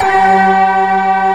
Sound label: STACK, FAIRLGHT; East West; Global Sounds